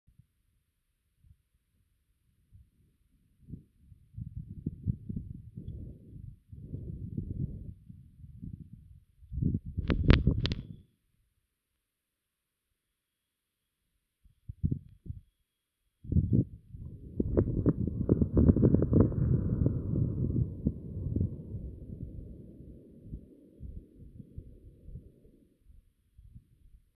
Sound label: electricity